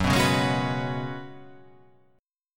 F sharp Suspended 2nd Suspended 4th

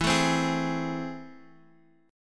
A Minor Major 7th, E

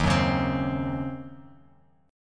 D sharp Minor 13th D sharp Bass Standard, chord; strummed; JGuitar

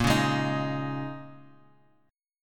Bb Minor 11th, Bass Standard, Bbm11 chord; strummed; JGuitar

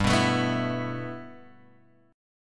G 6th Add 9th, Guitar, Open G

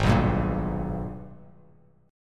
Ab Minor Major 9th, Bass; AbmM9; strummed

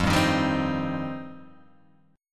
F 7th Suspended 2nd F down

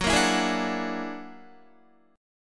Gb Augmented 9th Gb down Guitar 4E,4A,5D,5G,5C,6F; Gb+9 strummed

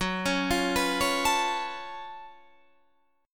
Gb Minor Major 11th Gb down Guitar Standard; GbmM11 arpeggiated; JGuitar

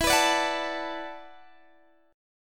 E 9th Suspended 4th E down Guitar Open D

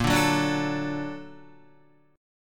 A sharp Augmented Major 7th A sharp Guitar; A#+M7 strummed; JGuitar

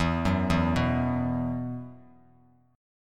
E Suspended 2nd E, down, Bass Standard

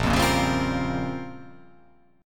Bb Minor 6th Add 9th Bb down; strummed; JGuitar

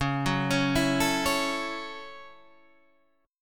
F sharp Minor 7th, guitar

